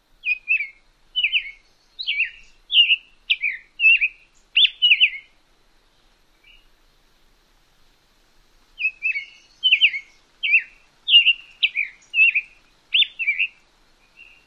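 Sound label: songs, Summer Tanager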